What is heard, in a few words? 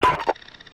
leave lobby alert; gmod; mw; user interface